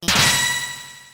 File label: engage; deltarune